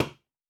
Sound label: Nail; Fantasy Sounds Bundle; Craft